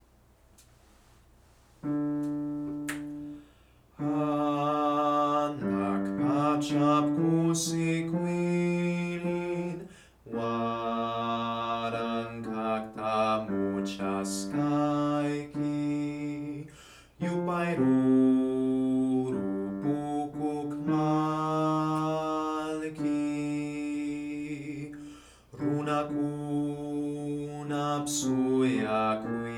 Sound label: bass